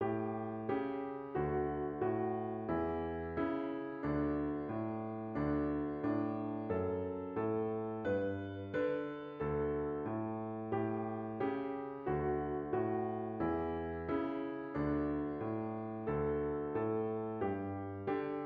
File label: twinkle with piano faster 90 piano